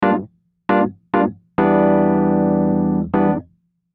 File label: Chords